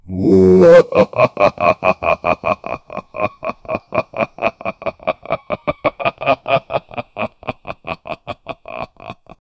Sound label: long loud male monster